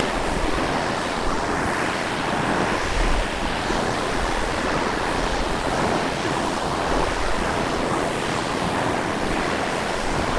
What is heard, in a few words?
waves